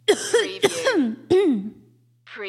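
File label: Female Cough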